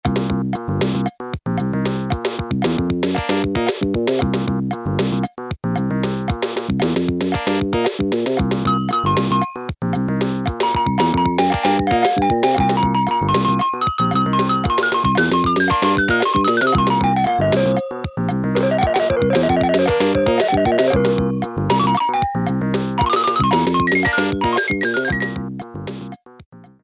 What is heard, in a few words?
FUNK 2, Casio Rapman rap patterns